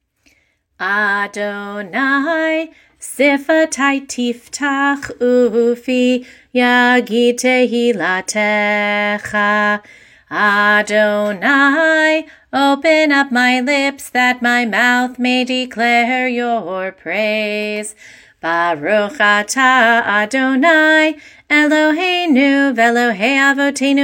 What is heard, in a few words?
Avot vImahot chanted; bnai mitzvah; barbat mitzvah